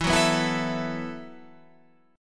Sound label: B 7th Suspended 4th E